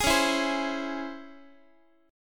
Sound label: C sharp Minor Major 7th C sharp Guitar; C#mM7 strummed; x,x,11,11,8,11; JGuitar